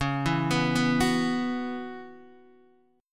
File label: Db Minor 11th Db, Guitar DADGAD; Dbm11 arpeggiated; JGuitar